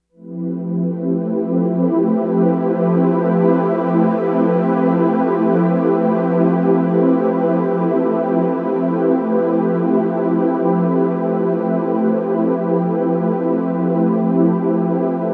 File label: Microkorg SP404 Pad; Crowdsourced Sample Pack; Pads